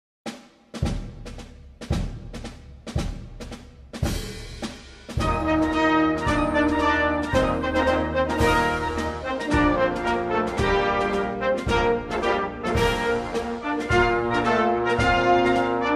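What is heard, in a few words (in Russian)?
gimn senegala, anthem of Senegal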